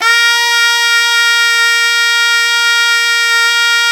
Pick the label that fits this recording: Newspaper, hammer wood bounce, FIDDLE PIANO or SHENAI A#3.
SHENAI A#3